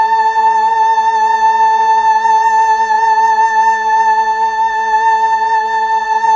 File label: Fem A3, Mellotron Female Choir